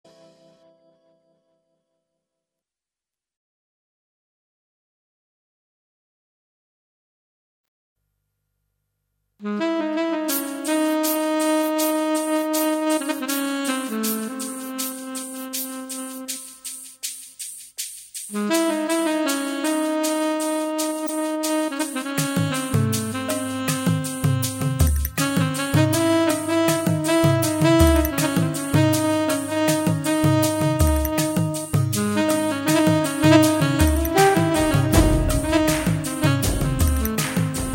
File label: Malayalam; Airtel